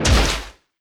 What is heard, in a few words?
Hit effect